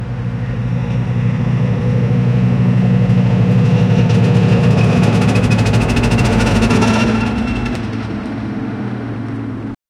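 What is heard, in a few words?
a heavy tractor struggling; agriculture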